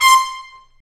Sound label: BRS TP, Roland, Stabs; Roland L-CDX-03